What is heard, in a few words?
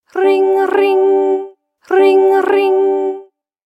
Rrrring